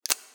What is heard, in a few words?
substation oneshot; nuke